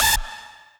lead a

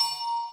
Bells

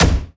drum hit normal

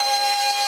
synth main

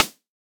Drum

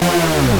synth; osu

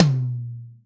TOM; osu

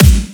normal hit clap

